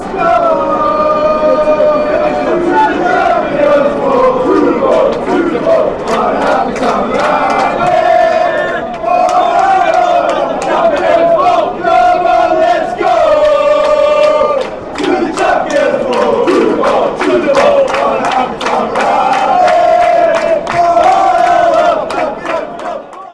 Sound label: champions ball, fan chants